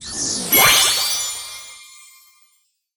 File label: Result Stageclear; Disney Star Smash; Android, iOS, Mobile